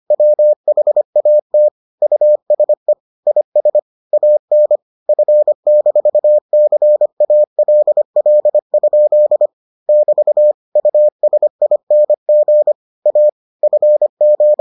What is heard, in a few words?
morse